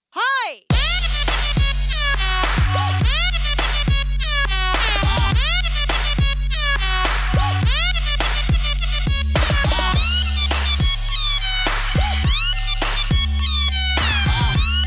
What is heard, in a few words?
club; tfc; tension